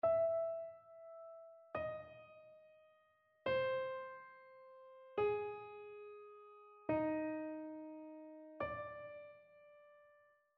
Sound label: interval chains